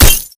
bullet light ballistic solid glass close

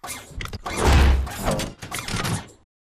melee high shoulder forward; Titanfall 2

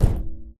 Footstep Jog Far; Titanfall 2

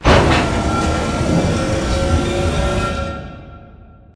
Large Concrete Wall Start; Titanfall 2; Boomtown

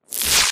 imp bullet energy bubbleshield; Titanfall 2

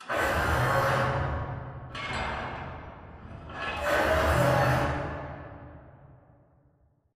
Robot Arm Pass Passer Dist; Boomtown; Titanfall 2

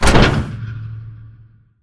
pod door ext verb open start; Titanfall 2

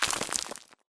bullet light ballistic concrete debris; Titanfall 2

